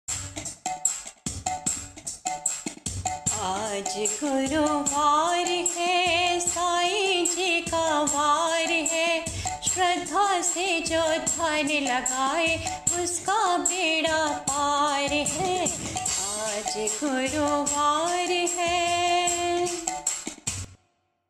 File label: devotional ringtone; Sai Baba